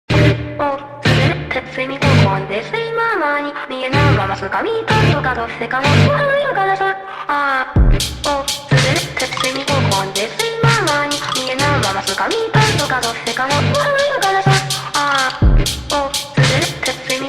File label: cute depressed slowed reverb